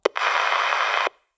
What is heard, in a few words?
transmission